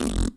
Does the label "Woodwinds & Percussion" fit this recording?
No